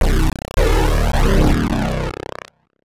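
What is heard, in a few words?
synth bass, drum and bass, 160 bpm, C; eJay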